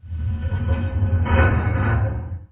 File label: Ambient